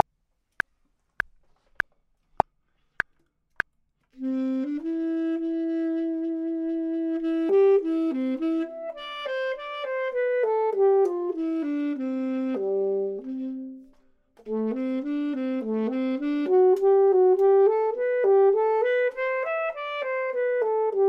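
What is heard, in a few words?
bottom, Saxophone and Clarinet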